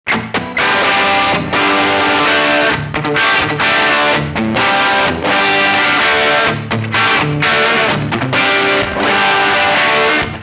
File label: straight6